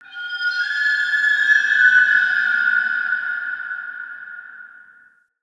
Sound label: Root Cave Mysterious Whistle; Ambience; Neathuns Root Cave